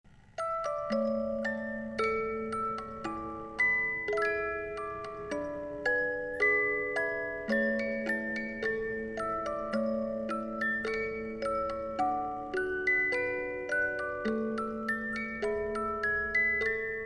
Magic Flute, music box

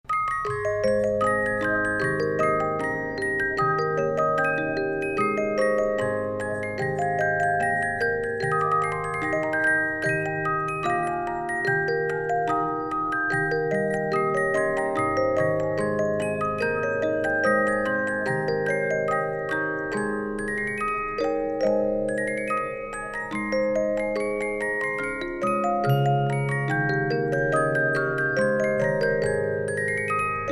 SANKYO The Magic Flute Vogelfanger, music box

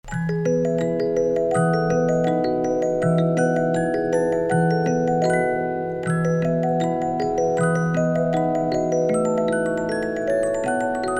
SANKYO; music box

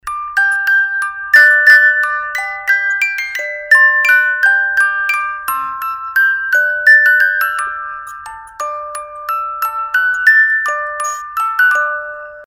Minuet Mozart, musicbox

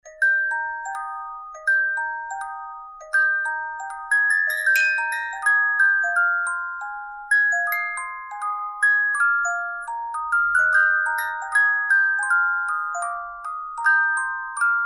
Spring Song, musicbox

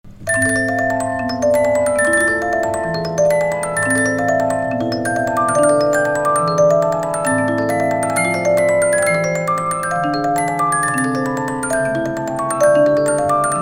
piano concerto 72 note, musicbox

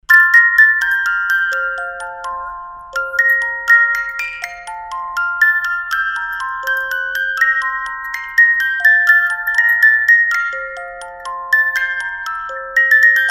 Lullaby Mozart, musicbox

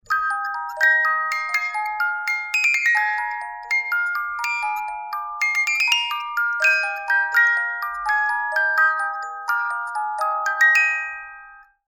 Skaters Waltz, musicbox

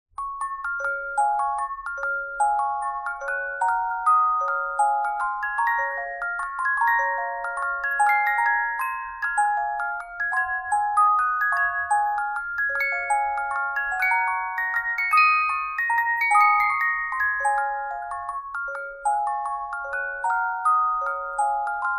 Waltz, musicbox

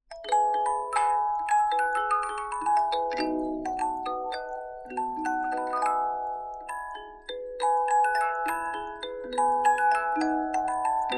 Waltz Eine Kleine, musicbox

